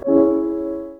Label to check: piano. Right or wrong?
Right